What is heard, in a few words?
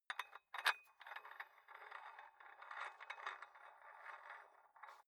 dumbbell turn nut thread slow; sport freizeit; fitnessstudio